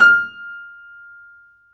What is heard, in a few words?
FF F 6, Grand Piano off mic; AKAI S6000 CD-ROM - Volume 2